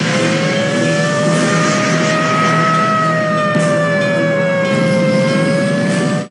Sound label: Roar; Fire Fighter Jet Jaguar